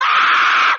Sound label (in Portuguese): Grito